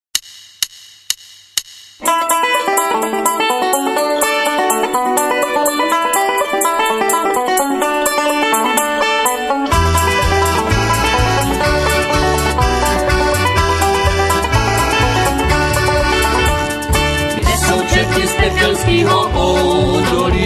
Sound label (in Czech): polka